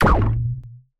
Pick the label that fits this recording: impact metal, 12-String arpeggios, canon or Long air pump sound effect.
canon